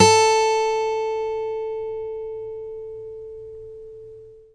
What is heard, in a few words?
6 String Steel Guitar A3; six string steel guitar